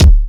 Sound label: KICK, DRUMS; USB Soundscan vol.02; Underground Hip Hop; AKAI